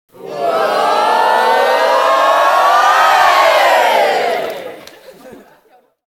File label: Laola